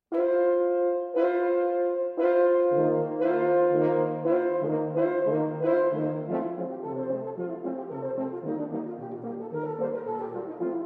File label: Hoedown, Eurobrass